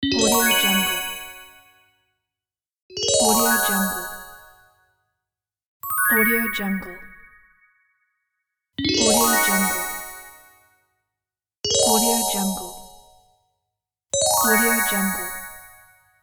magic fairy spell pack

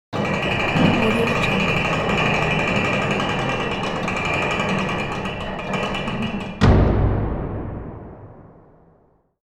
castle gate closes with chain pulley; hollywoodedge

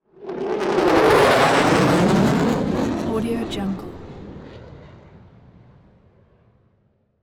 jet pass by; blast drive 30; prosoundfx

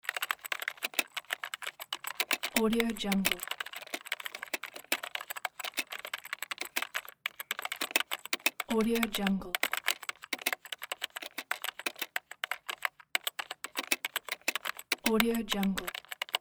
typing an email

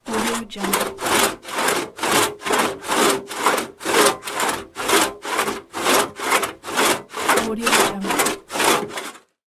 double saw; crisstyna

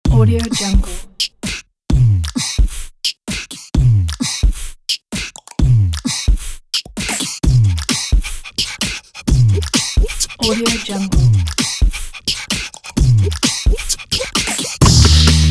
upbeat beatbox

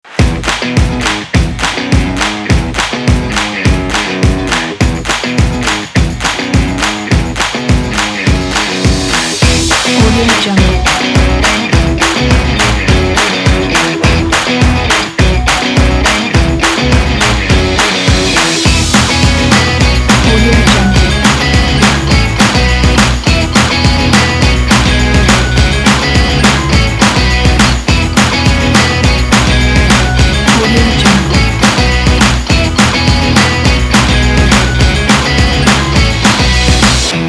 happy upbeat

